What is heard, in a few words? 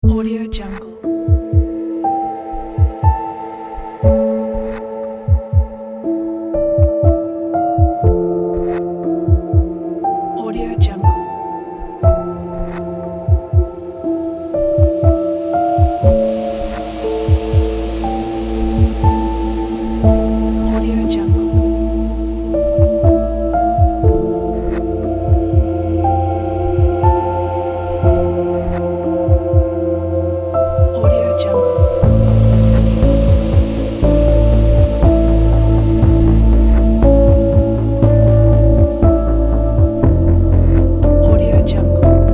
dark cinematic piano